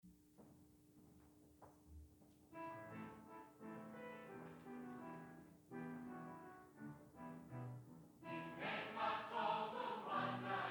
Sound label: Upbeat, choir